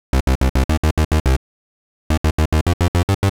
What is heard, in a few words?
Warbs 142C, uk garage samples, 142bpm, Synths